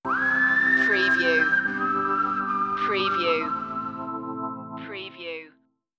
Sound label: the whistle song stinger